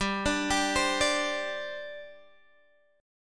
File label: G Suspended 4th G down Guitar Half Step Down